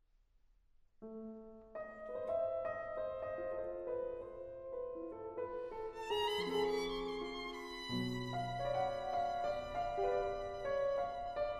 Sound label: In the Romanian folk style